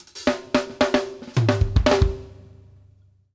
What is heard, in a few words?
OFF HAT, DRUMWORKS FILLS 108